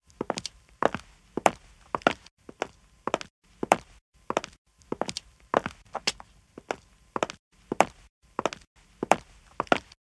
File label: Perimeter steps; Pitch Black - A Detective's Demise